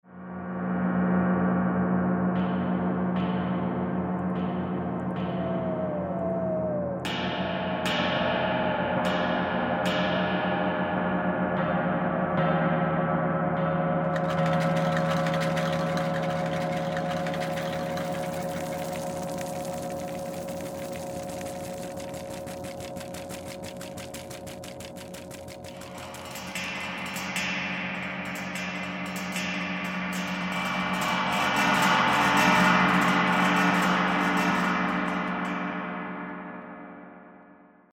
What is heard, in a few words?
Gong Rattle